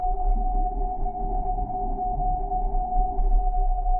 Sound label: Tonal Re Draw, sparse soundscape